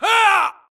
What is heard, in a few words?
grunt death quick; Titanfall 2